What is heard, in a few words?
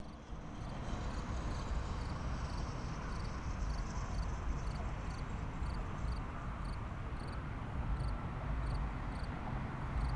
cricket; crickets